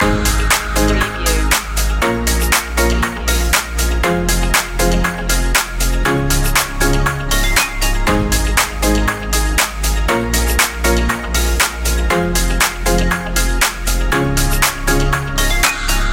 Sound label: tropical summer loop